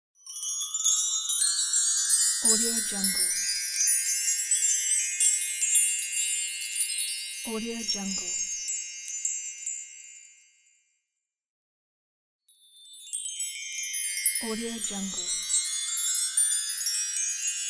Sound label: chimes; volkovsound